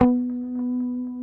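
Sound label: B 3A, compressed Strat, guitars; Spectrasonics; Hans Zimmer Guitars VOL-1; 90's Sample CDs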